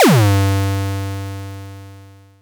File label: Kick, Ghetto Electro Kicks